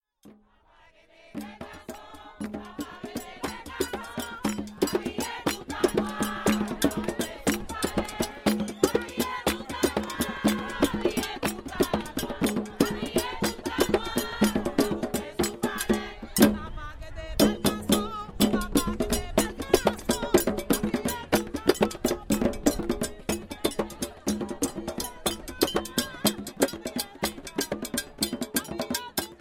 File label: merengue